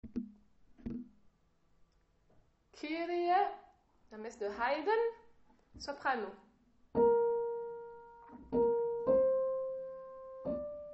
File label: Haydn kyrie sop